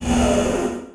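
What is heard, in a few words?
door door2 open; 星际争霸音效-misc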